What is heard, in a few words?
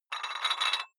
dumbbell take off rattling weight, fitnessstudio